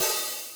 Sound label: HAT OPEN; GOTEBORG